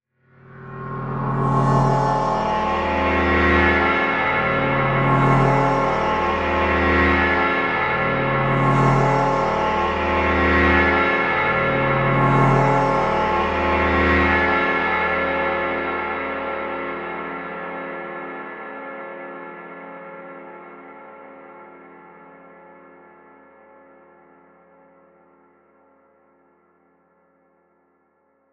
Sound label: Metalic pad 135bpm; Crowdsourced Sample Pack; Pads